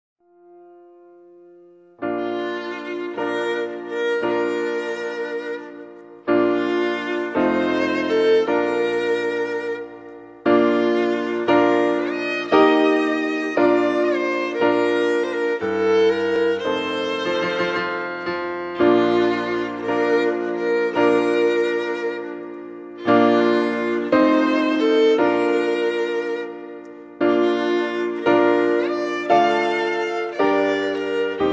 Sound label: Bridal Chorus